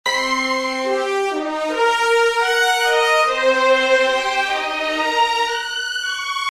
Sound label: counterpoint solo, Only soloing instruments; epic orchestral track